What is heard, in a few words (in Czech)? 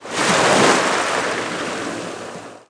Vlny; wob2 demo